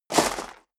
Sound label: step sneaker gravel medium jump